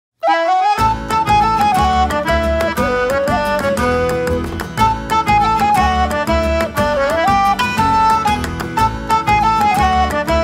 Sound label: Shop's jig